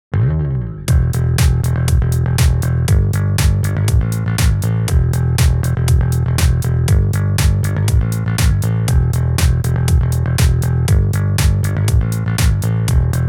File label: bass comparing vst pick